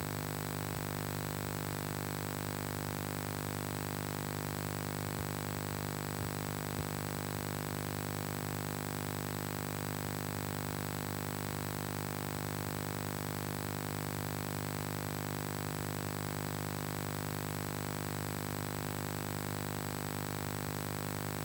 Vhs Vcr Magnetic Tape Cassette Background White Noise Hiss